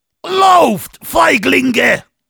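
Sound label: battle cry, German; Heavy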